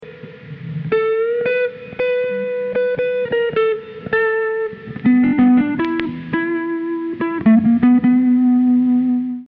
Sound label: 12bar